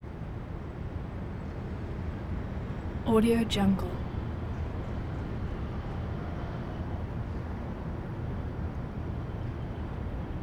urban traffic ambience downtown los angeles

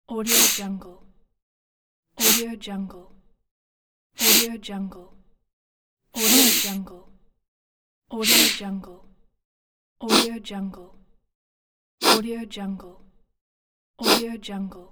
sniffing male singles pack

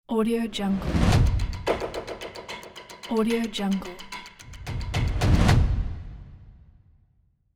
sticks tribal logo

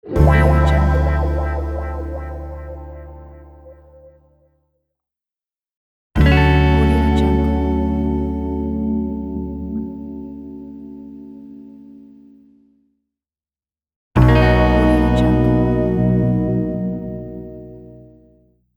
chord opener

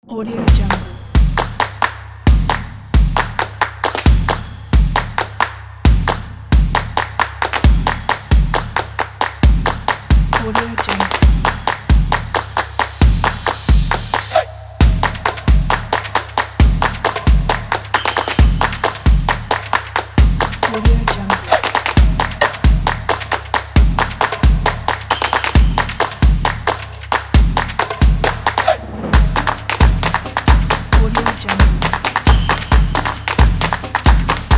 at the drums

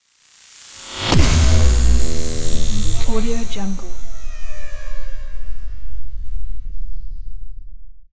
sinematic neon designed leviathan; soundmorph

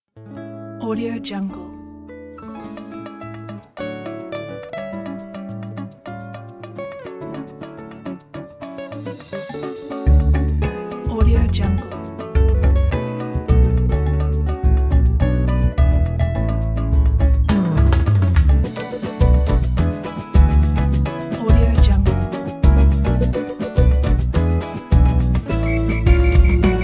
emotional brazilian samba

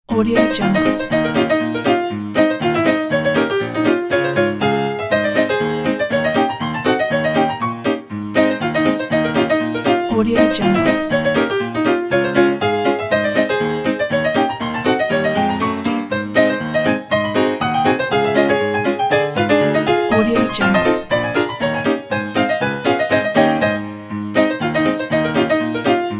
comedy ragtime

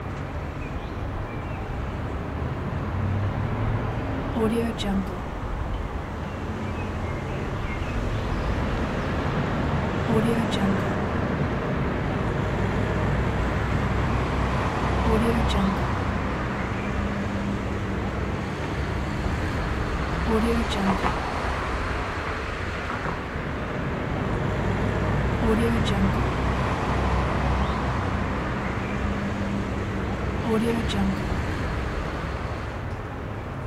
ambiance traffic busy above perspective loop

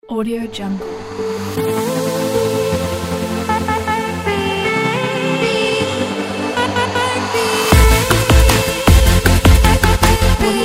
future bass; onewavestudio

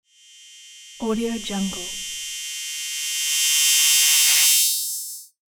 neo laser transition

